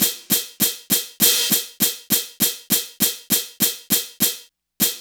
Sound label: tag hat loop, hip hop; E-MU Formula 4000 Series Vol. 1; Hip Hop Nation; Laser Tag 100